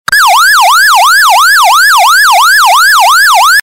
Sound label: Yelp